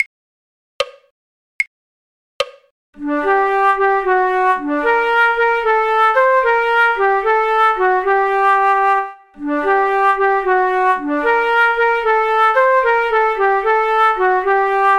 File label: flute; the miller of dee; folk